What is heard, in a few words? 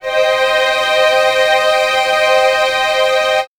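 String Ensemble minor c4; TrashLibrary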